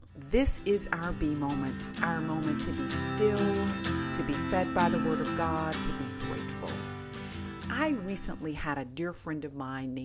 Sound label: Laughter Non Moody